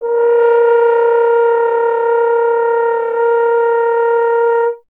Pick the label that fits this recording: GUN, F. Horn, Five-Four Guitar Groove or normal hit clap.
F. Horn